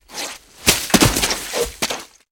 Body Falls Mud Heavy

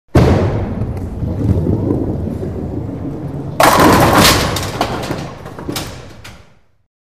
Bowling Ball Rolls

